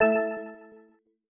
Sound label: Wild Water Stacked